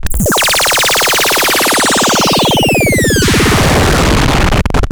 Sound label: Rise Fall long Distortion wobble space future transition loopable modular synth; Matt Script